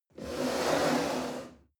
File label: chair wood slide on floor medium